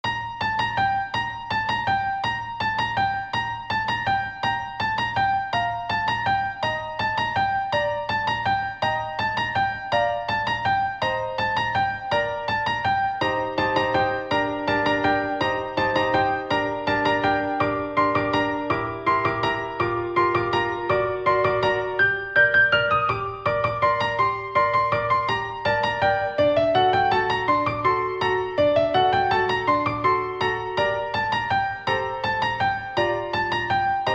Carol Of The Bells Khong Loi Piano

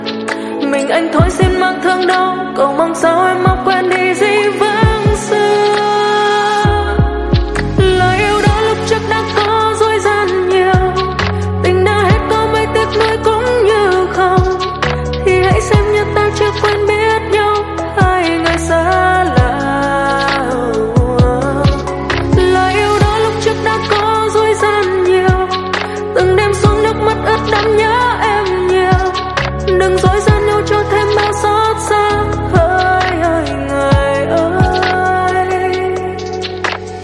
Nhac Chuong Lofi Ver

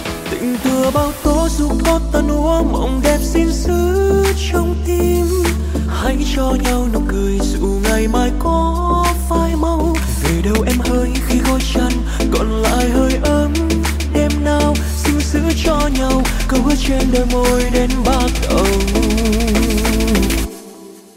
Nhac Chuong

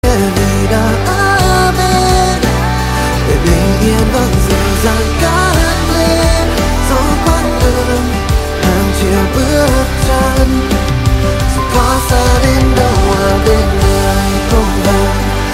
nhac chuong rock

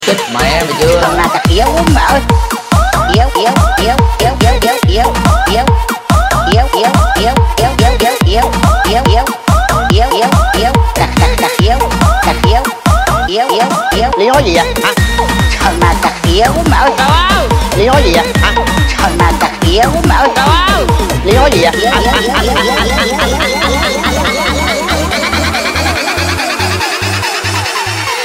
che remix

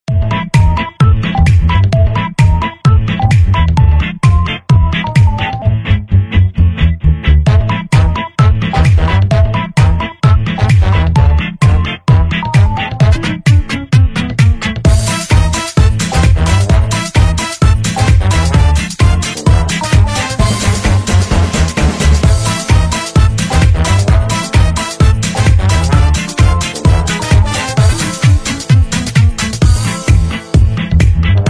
meo den remix tiktok khong loi

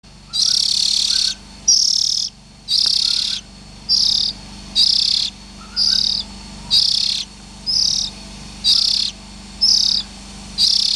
Tieng chim Chich Choe Than non keu

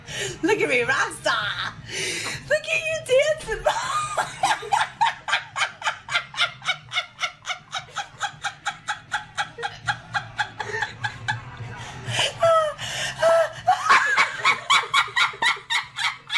nhac che tieng cuoi dien dai cua nguoi phu nu

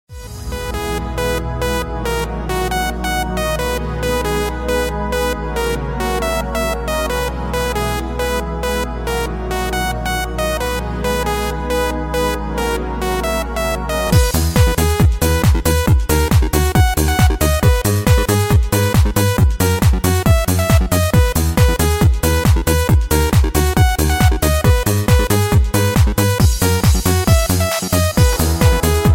Khong Loi Ringtone

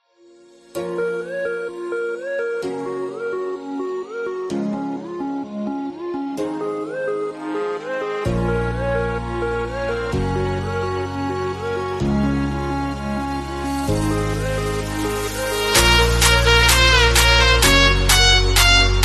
Nhac Chuong Remix TikTok

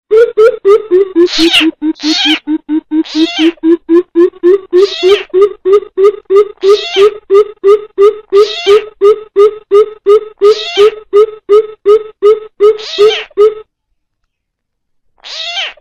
Tieng chim bim bip va nhai keu

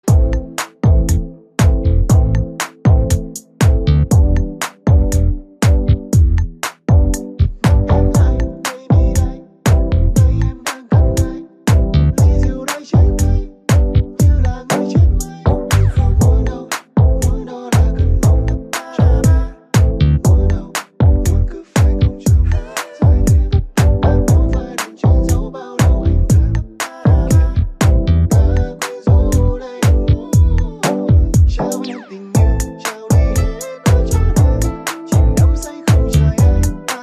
không lời beat

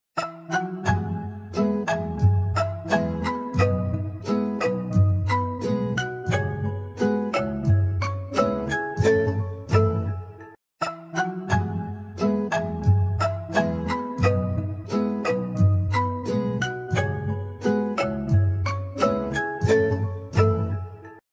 khong loi xiaomi poco f5 pro, ringtone

